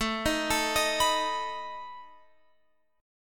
A Suspended 2nd Flat 5th, Guitar, Open F; Asus2b5; arpeggiated; chordshape

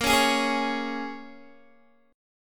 Bb Minor 7th Bb, Guitar, DADGAD; Bbm7 strummed

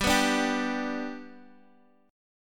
G Major, Guitar; strummed; 14,14,14,12,x,x; 4F,4A,5C,5G,5C,6E

